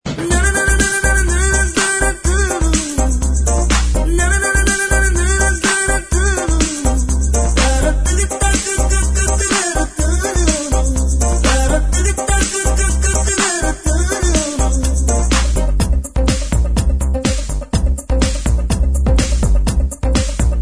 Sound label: Ringtone, Tamil; melodious